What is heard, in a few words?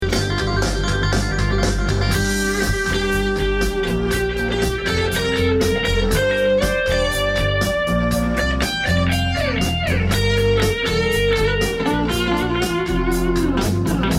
Graig drive, Pickups